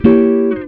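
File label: guitar chord; Univers Sons; Jazzistic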